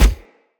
Cannon Tesla Shot Punch; Weapons; Player

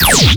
Fire Shot 2D, Weapons; Warframe; Bronco Prime

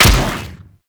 Inf Vomit Gun Shot; Infested; Weapons

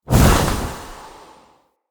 Casting Device Cast Heavy; Galene

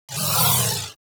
Crewship Turret Proj

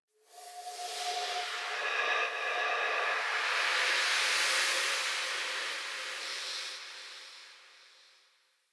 Spirit Fly, Ambience; Soul Plane; Orlick

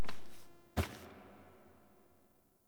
Foley Step Turn; Teshin; Warframe; Cinematics; TheWarWithin; TWWQueensLair

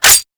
Dual Pistol Mech; Warframe; Grineer; Queen Guard

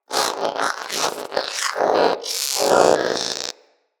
Companion Vocal Breath Run, Pets; Zanuka

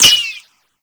ricochet; Warframe; Cowgirl

